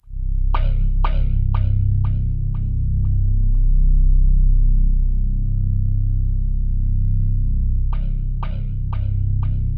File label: C2, Synth Leads